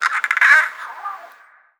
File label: birdbirdbird idle; npc; Portal 2